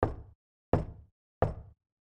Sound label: Knock 3x Slow